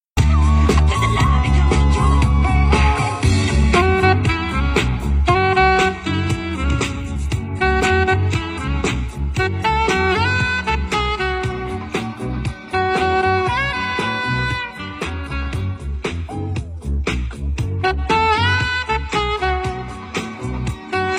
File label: Saxophone